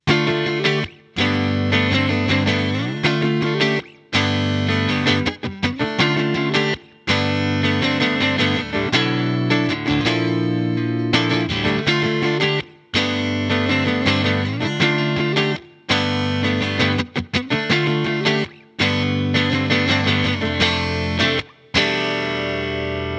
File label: guitar; pillbug